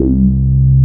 A BASS; CMP - Analogon

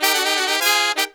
Pop & Funk Brass

